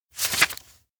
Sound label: teacher show memo; rikka; anemoi